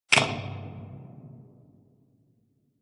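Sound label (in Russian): прожектор включили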